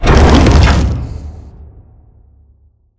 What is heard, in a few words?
Glitch Terrain; Pre Ash Fight; Titanfall 2; Boomtown